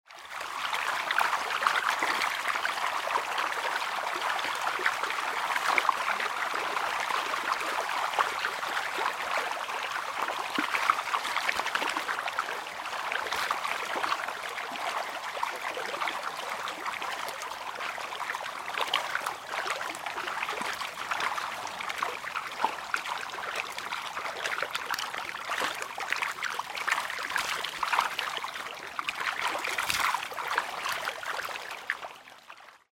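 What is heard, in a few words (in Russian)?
пираньи в воде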